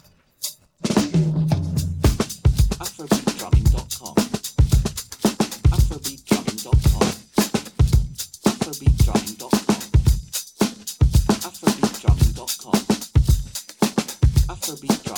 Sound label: crazy afrobeat